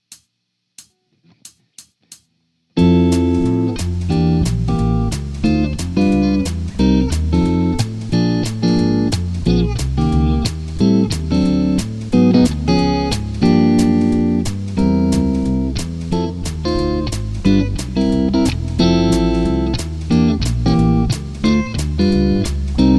Backing Track

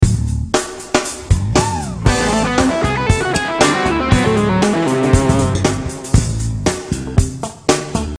Lick

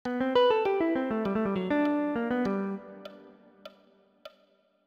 Lick

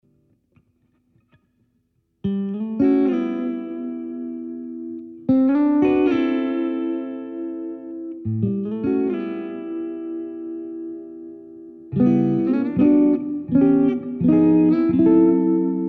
Blues Example

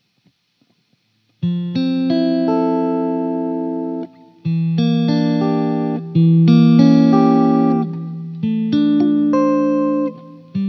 Chords Slow, guitar

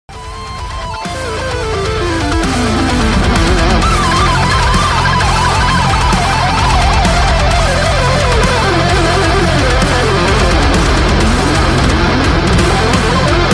fast scale, guitar

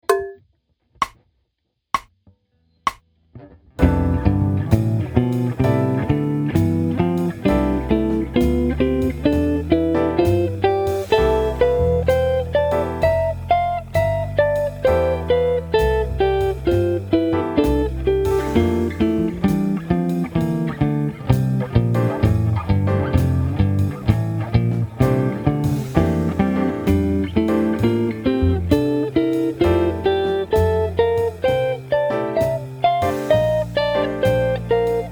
major 7 exercise quinte contemporaneamente

